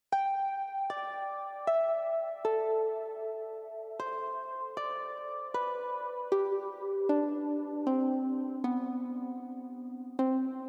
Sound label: Reverb Delay Celesta; Mix Insert